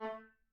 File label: Grand Piano, Kawai, rel A2; Chordophones; Releases